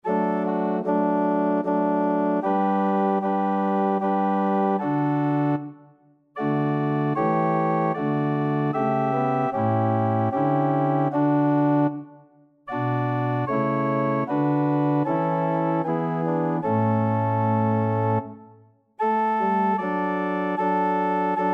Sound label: SATB; O esca viatorum